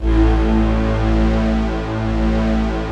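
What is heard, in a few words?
Chinese orchestra, Filter; Optical Media International; Sonic Images Library; Chino Combi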